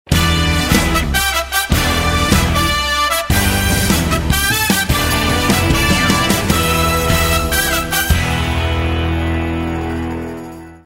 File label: music interlude